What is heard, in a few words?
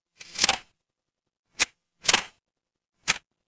office camera